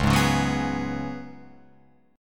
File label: C sharp 7th Suspended 4th C sharp, Guitar Open F; C#7sus4; strummed; tuning=Open F; guitar chord